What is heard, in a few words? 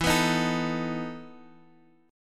E Major 7th Suspended 4th E, Guitar, Half Step Down